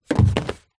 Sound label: household book small place on shelf